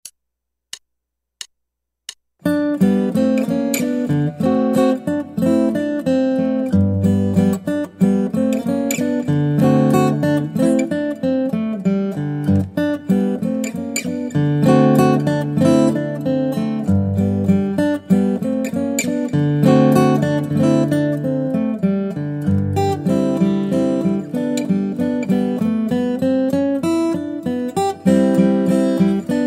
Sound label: Guitar